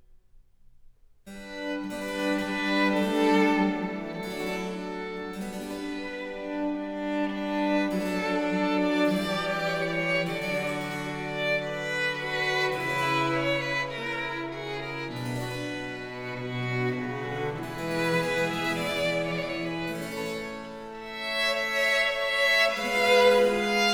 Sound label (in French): Pièces de violes, Suite in C Minor; Book 3, No. 23-32; Nicola Matteis; Ayres for the Violin; Quatrième Livre (Paris 1717)